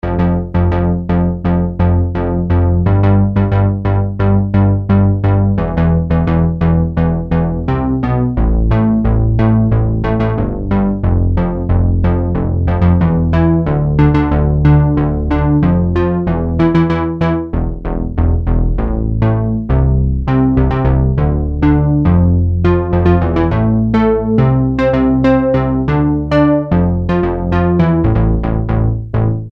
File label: DIGIBASS, Kawai SX-240